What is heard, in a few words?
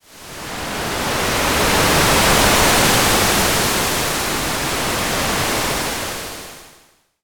wave